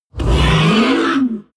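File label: coloss roar deep short, creatures; kreaturen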